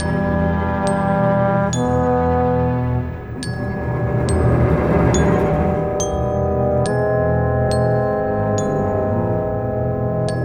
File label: Cinematic Orchestra; Sonic Foundry (Sony Creative Software); Orchestral Series VOL-3 - Cinematic